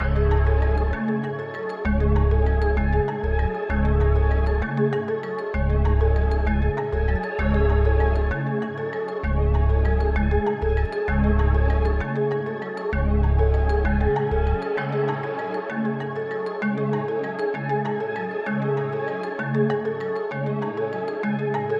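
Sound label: 130 C minor Dark Ethnic; Hole In His Hoodie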